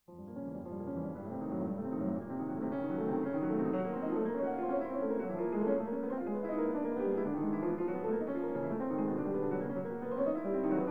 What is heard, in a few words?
Piano Sonata No.2 (4th mov); Chopin